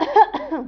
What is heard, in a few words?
Cough female